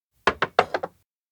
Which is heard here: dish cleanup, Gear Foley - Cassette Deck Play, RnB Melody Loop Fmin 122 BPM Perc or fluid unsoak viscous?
dish cleanup